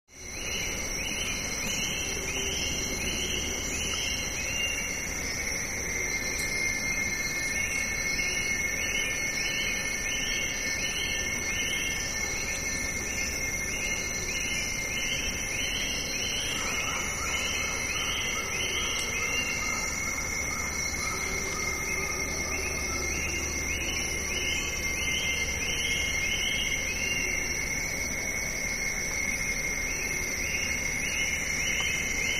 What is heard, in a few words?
Jungle South America, rainforest; Jungle Atmosphere; South American Rain Forest Atmosphere With Long Shot Bird Calls.